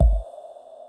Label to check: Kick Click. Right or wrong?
Right